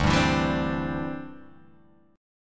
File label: D 7th Suspended 2nd Suspended 4th, Guitar Open G